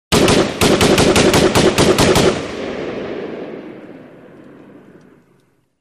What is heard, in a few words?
AR15 Semiautomatic R